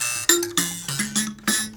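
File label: PLK Bass Mbira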